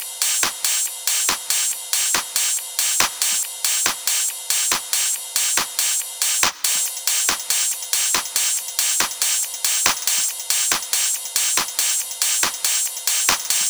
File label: drum Loop 140BPM Full